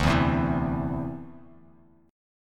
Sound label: D 7th Suspended 2nd down, chord; D7sus2 strummed